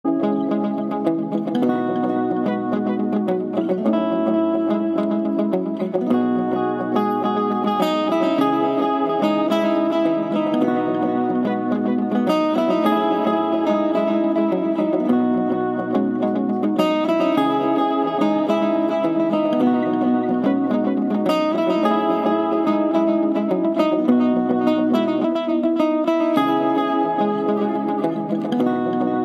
iPhone SE Ringtone

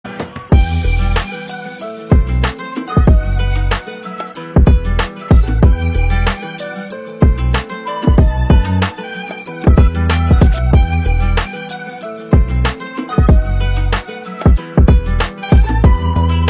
Tech House Ringtone

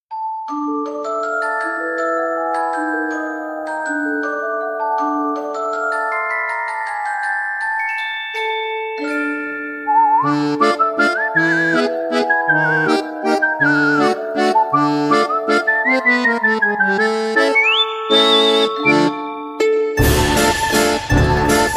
Halloween Cute Horror Song Ringtone

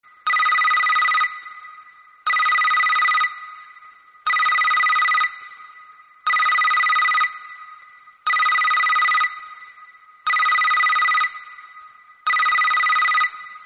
iphone Classic Bell Ringtone

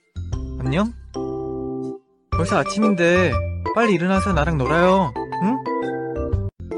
Alarm

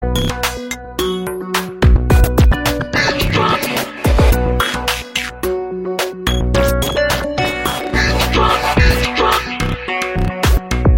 Ringtone

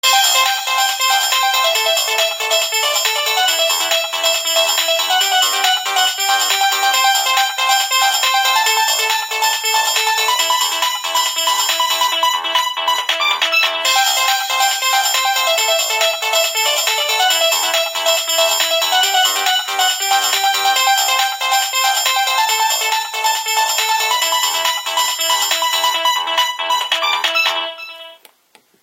loud polyphonic ringtones

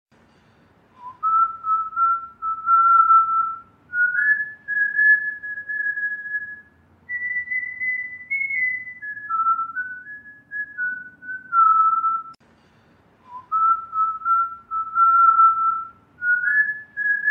little whistle

